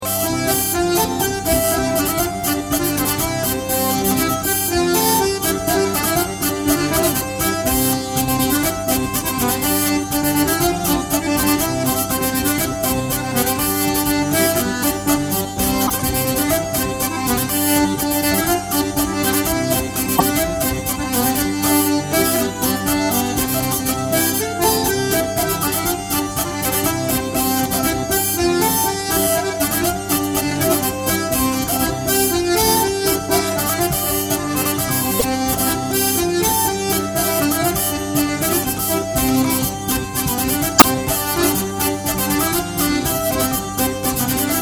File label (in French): Polka; Musique Du Morvan